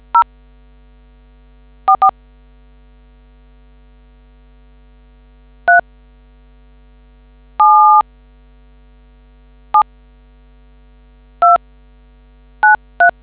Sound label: dtmf